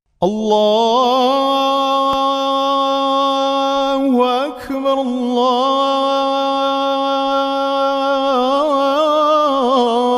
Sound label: azan